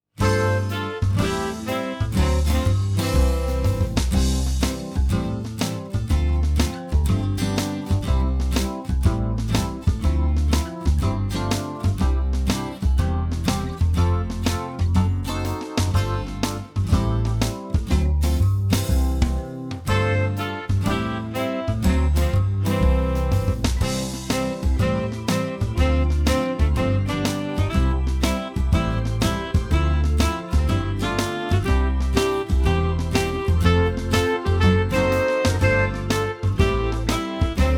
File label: In the autumn backing track